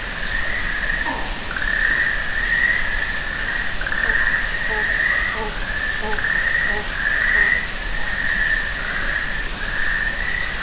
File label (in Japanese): okonoha